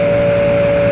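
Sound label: engine exhale, advanced engine sounds; engine2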